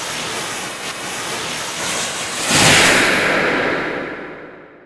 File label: homing missile enemy; weapons; dota; gyrocopter